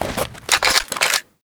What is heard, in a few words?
rg6 reload, weapons